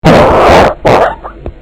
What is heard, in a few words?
fart